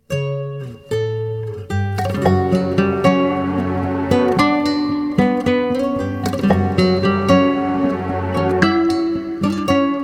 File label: instrumental; persian